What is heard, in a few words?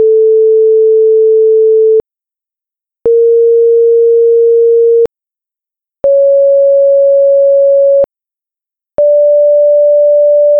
plagal defteros scale